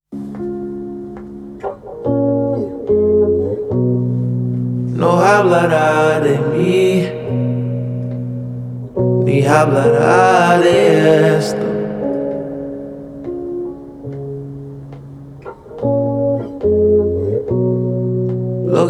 Acoustic; TikTok Sad Songs

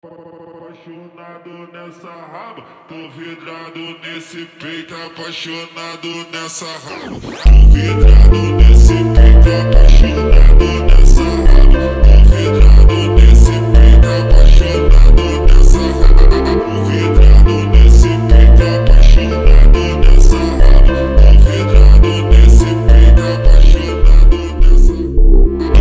phonk

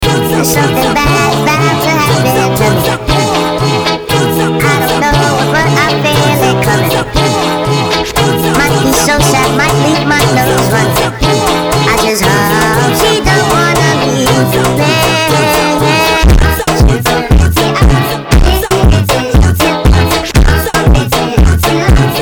(FUNK Nightcore)

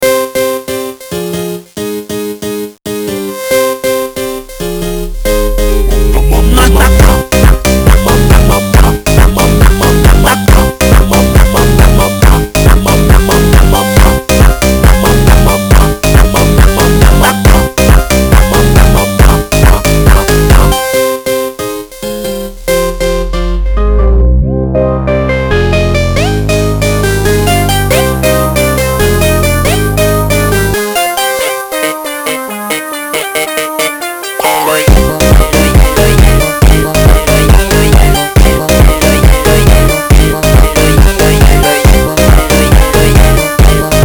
Sped Up

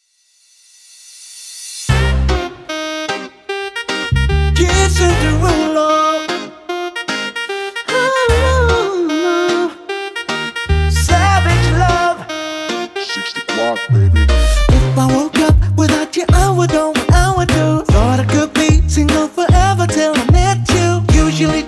Siren Beat

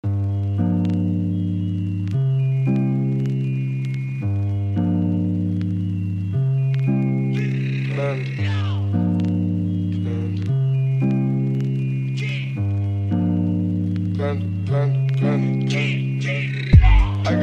Lofi Remix

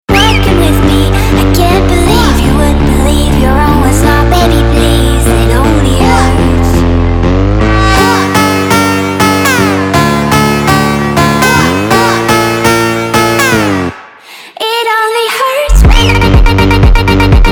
Sped Up

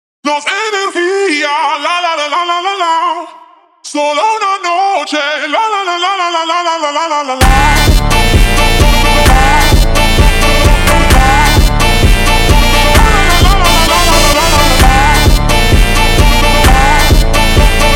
FUNK

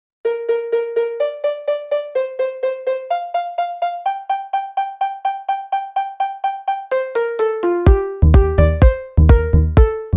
Minecraft Note Blocks

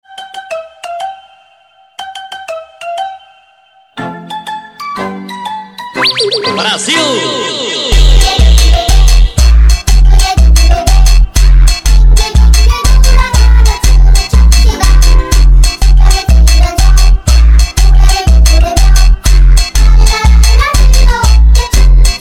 Funk Sped Up

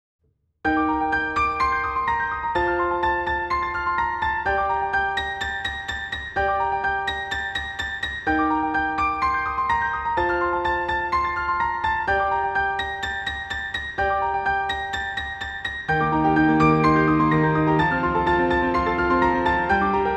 Piano Version

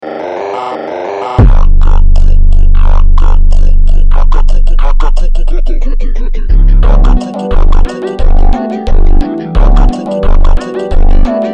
funk ultra slowed

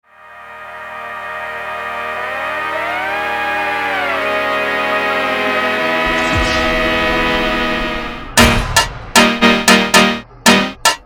FUNK

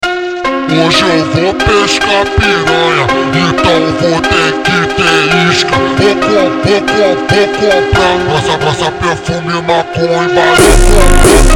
(hardstyle) Ultra Slowed

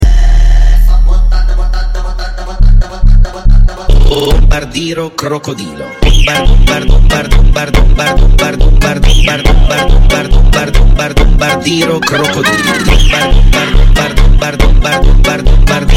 funk sped up

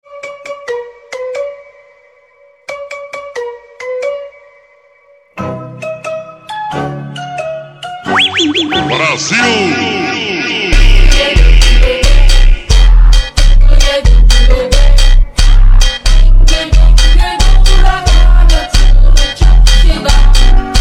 Funk Ultra Slow

